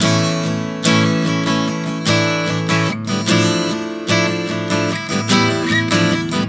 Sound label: Prog 074 A-Dm, Acoustic Guitar, Chord Progressions 074 bpm; Sonic Foundry; Songwriter Acoustic Guitar Companion